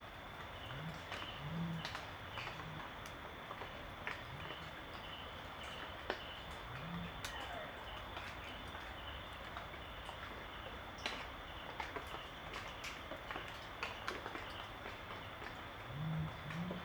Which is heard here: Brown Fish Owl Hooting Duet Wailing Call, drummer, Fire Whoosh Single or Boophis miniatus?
Brown Fish Owl Hooting Duet Wailing Call